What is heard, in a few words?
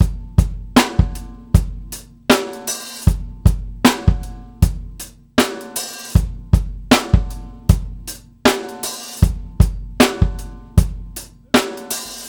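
Shuffle 78 bpm, beat loops; Zero-G; Monster Beats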